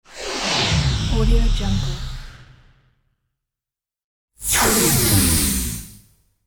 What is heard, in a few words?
big laser cannon; ikosonik